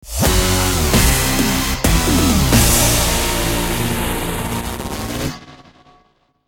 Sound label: Death Stinger